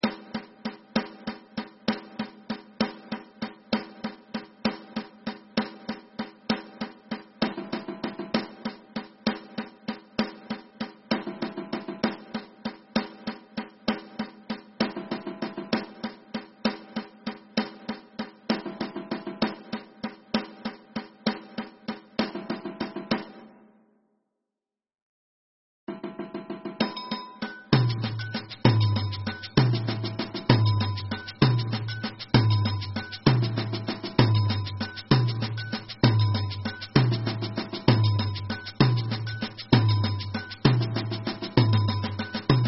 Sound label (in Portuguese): batucada